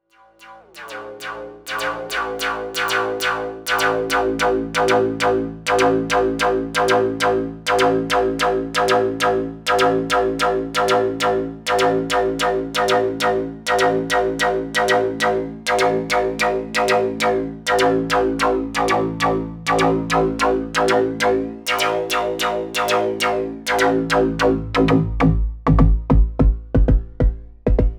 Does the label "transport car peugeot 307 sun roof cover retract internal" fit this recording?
No